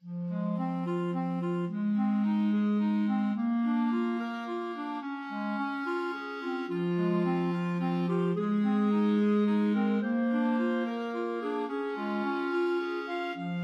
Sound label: clarinet trio